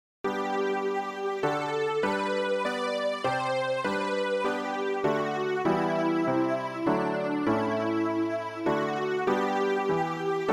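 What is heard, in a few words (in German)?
sopran, Übehilfen für das Erlernen von Liedern; ref gesangbuch; rg 259 licht das in die welt gekommen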